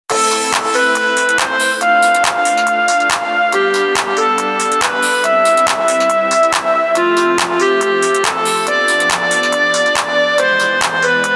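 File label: nostalgia instrumental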